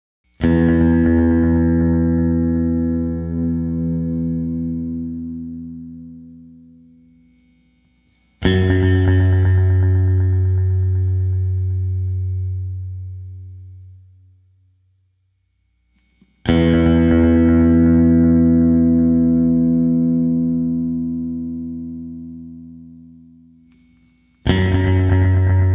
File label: Intro Guitar